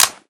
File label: rifle ready; Left 4 Dead; Source Engine